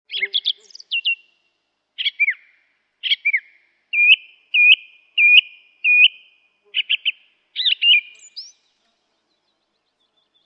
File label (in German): Vogelstimmenspiel Singdrossel